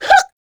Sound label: succubus shout; queen girl